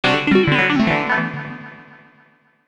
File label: FX; Airdrome